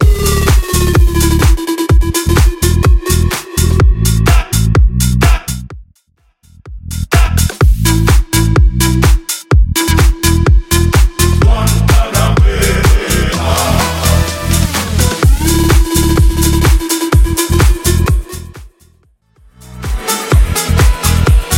House 126 Bpm

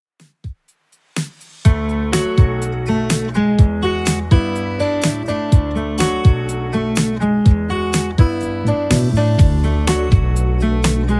2ks Redrum 124bpm

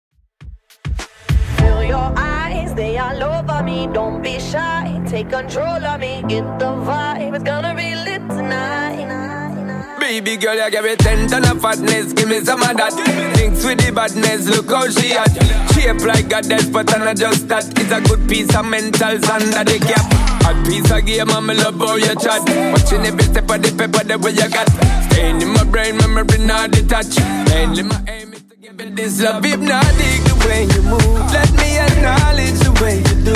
Rnb Redrum 102bpm

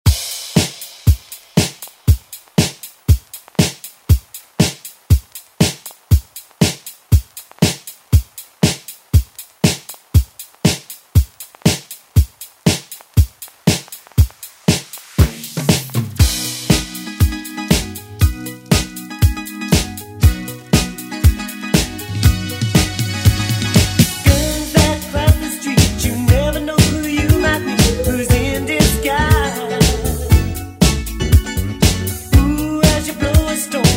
80s ReDrum; DJ Mhark

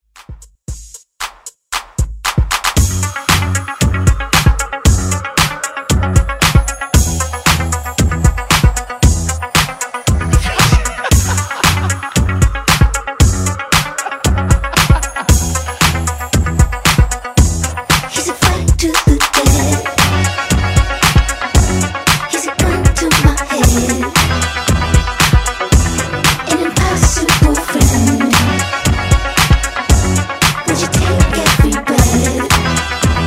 Top40 Redrum

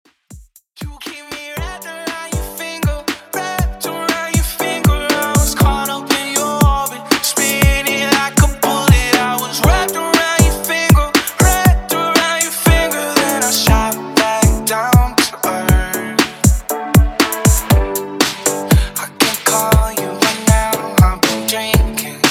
ReDrum Uptempo 119 BPM

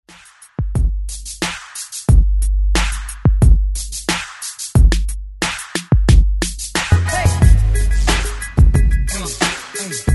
Re Drum 90 Bpm; DJDOLLS

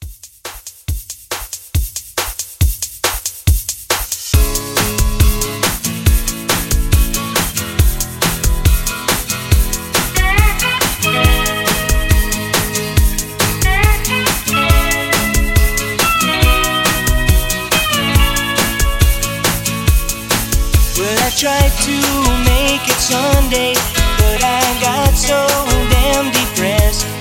70s Rock Re Drum 139

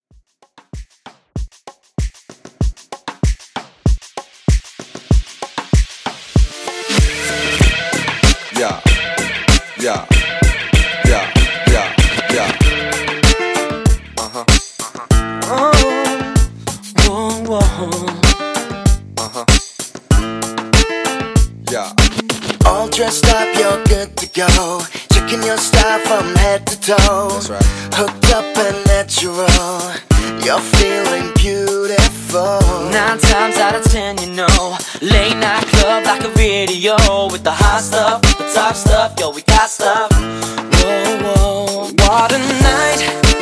Extended Redrum 96Bpm

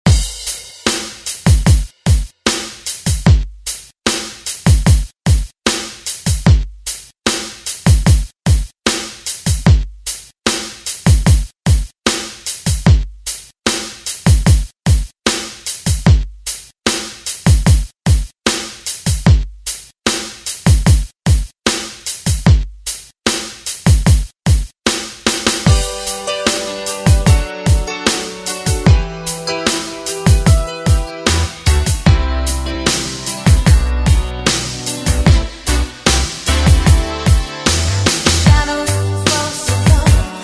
80s Re Drum; DJ Mhark

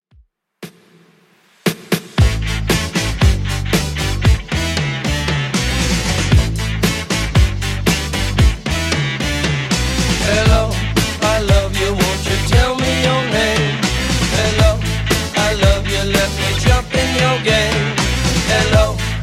60s Redrum 116bpm

